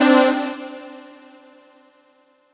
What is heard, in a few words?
Dance Mega Synth; Best Service; 90 s Sample CDs